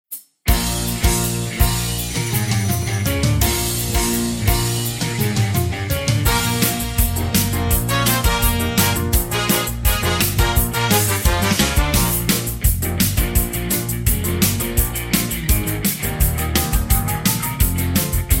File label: No Backing Vocals; Bb